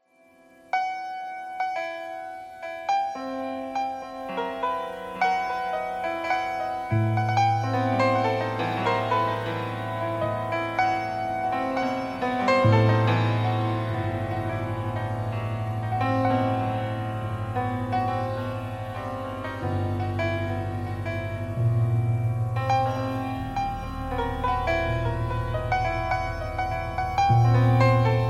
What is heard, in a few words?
piano thread noise